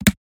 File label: tumbler, electrician